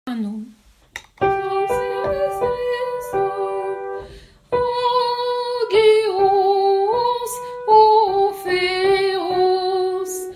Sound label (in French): grec Soprano; Saint Dieu